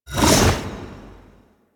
Hammer Glass Whoosh E; Hammer Glass Prime; Warframe; Weapons

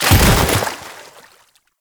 Body Fall Light Water, Foley; Enemies; Warframe; Tau Dax

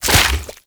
Tree Seed Explosion; Ambience; Warframe; Infested Eris

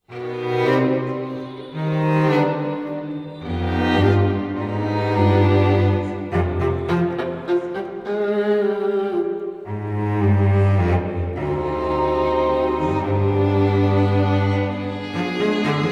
Anger Cue; Warframe; Duviri; Duviri Paradox Quest; Duviri Diorama

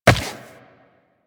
Run Rock, Footsteps; DE; Warframe; Tenno

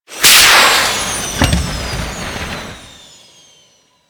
Jet Pack Land; Jet Pack Marine; Warframe; Grineer

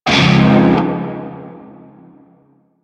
Shawzin Phrygian Chord B; Warframe; Narmer Shawzin

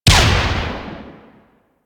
Flame Spear Explosion; Grineer

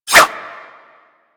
Dagger Whoosh; Warframe; Weapons; Dual Daggers

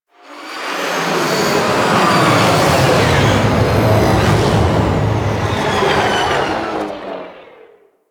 Crp Ship Fighter Thruster Lift Off Long Land A; Ambience; Props; Warframe; Corpus Ship Remaster

